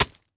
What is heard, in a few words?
Impact Vegetables Hit